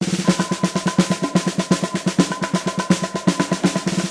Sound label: class menu bg, music; source engine; tf; video games